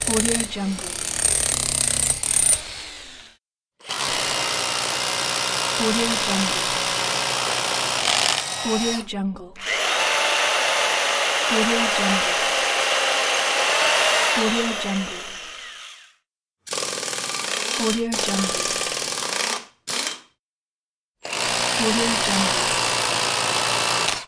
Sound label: drill perforator pack